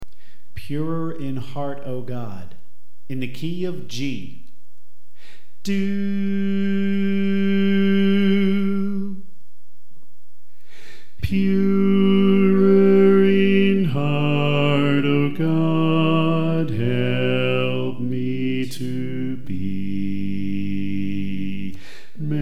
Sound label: Bass